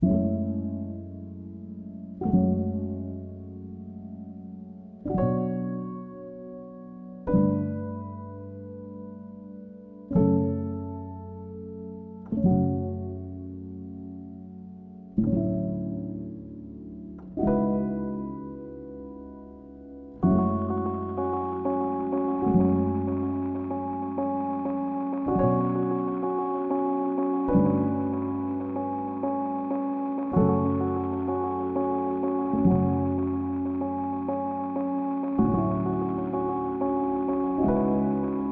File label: Lo-Fi A Drive at Dusk Intensity 1